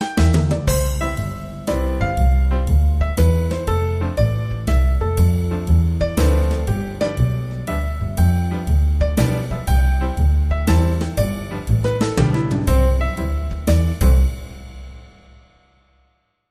Piano Concerto, Sting

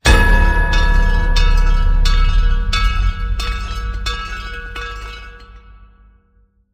Bell Hit and Ring

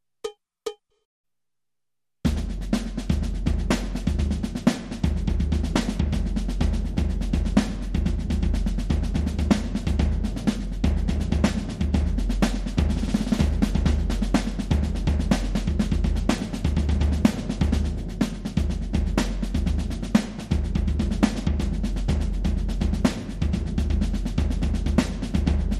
Marching Band Loop, No Cymbals Cowbell

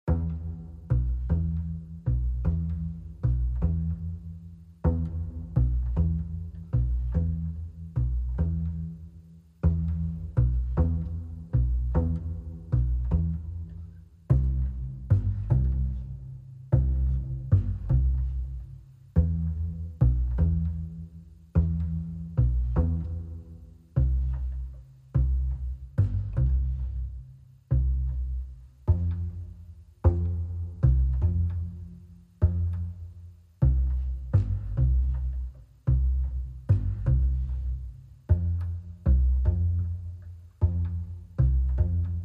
Bass Only

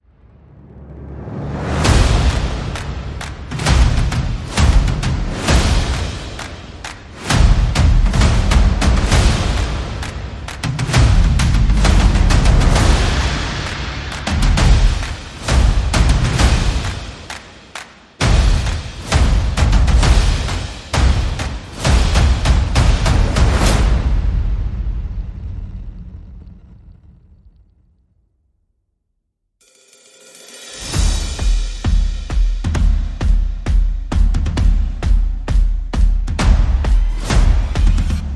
Percussion and Effects

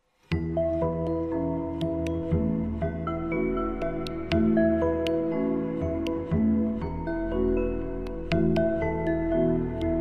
Plasma Oscillation

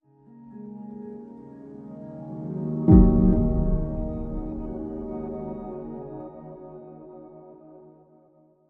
Link Sting

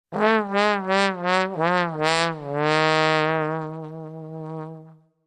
Trombone Classic Wah wah

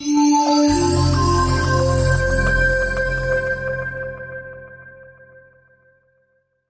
Sonic Soft 3 Note Logo

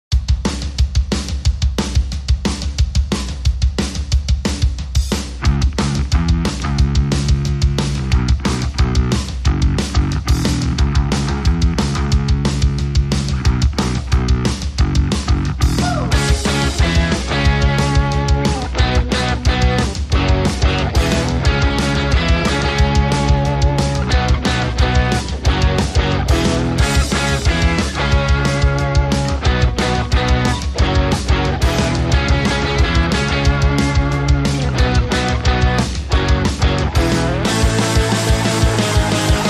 No Outro Lead Guitar

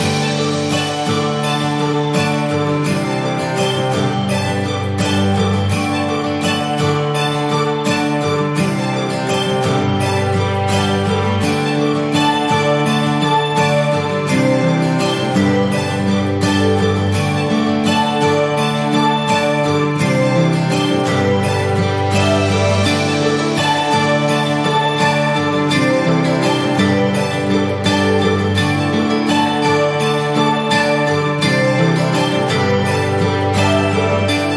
Loop String

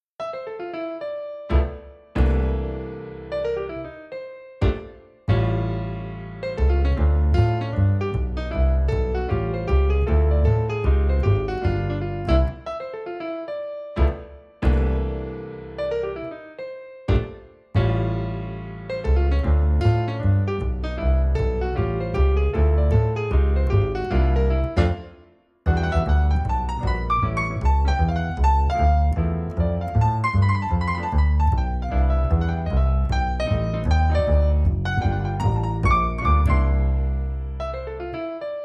Party Of Three Piano & Bass

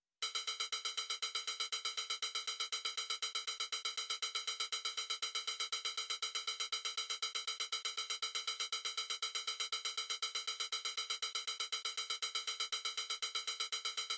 Electro, Percussion Only

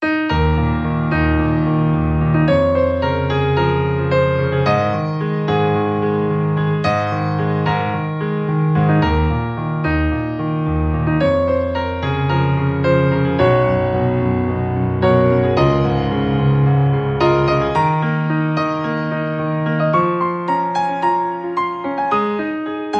Piano and Strings

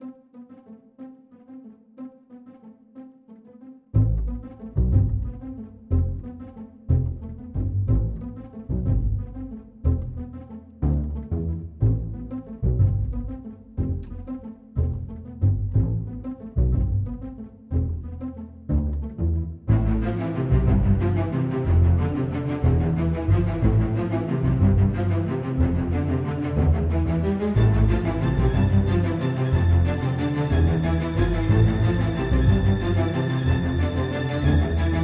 Strings

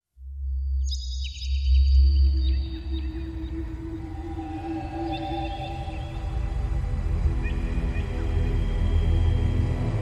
Oriental Dawn

